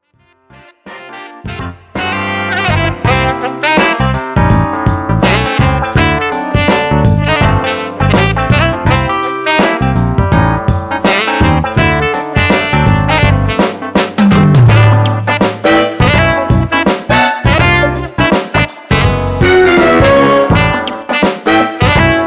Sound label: Infectious Funk